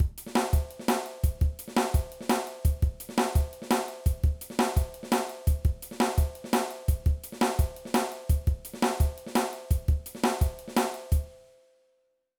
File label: Groove Drum and Bass Drum Patterns